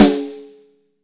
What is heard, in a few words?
smooth bottom end steel snare drum sample c sharp key